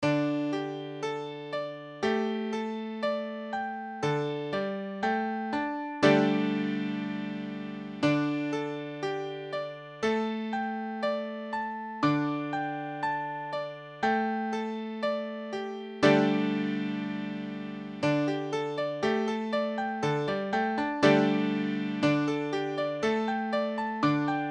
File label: Dsus4, piano